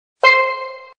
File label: C Drum, Casio